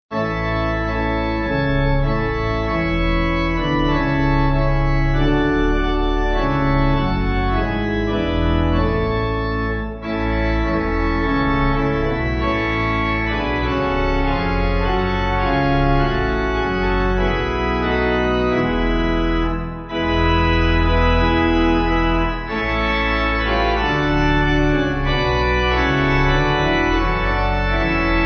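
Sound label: Pipe, Pipe Organ backing; Christ Is Made; Regent Sq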